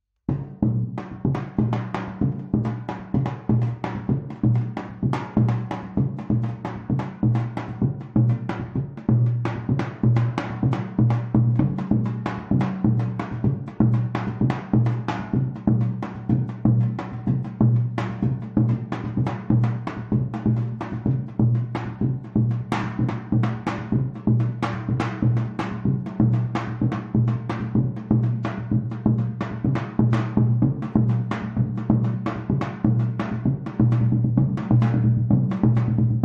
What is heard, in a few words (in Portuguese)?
zabumba